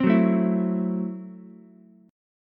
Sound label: Gb Minor 7th Flat 5th, Ukulele; strummed; JGuitar